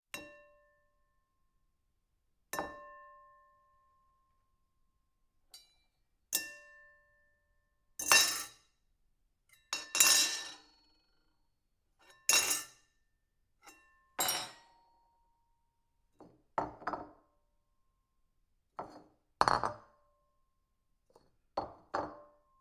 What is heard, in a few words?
silverware on bowl